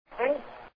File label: radio sweep